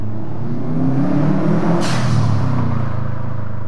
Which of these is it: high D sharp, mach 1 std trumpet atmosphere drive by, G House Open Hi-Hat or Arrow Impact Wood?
mach 1 std trumpet atmosphere drive by